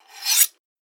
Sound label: Knife Being Sharpened